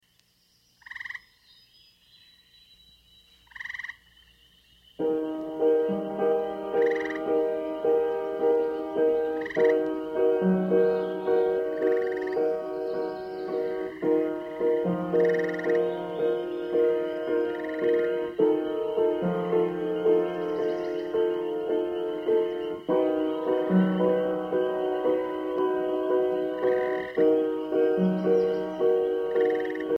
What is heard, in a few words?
pieces for piano; op.1 no.4